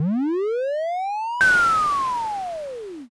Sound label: beam cannon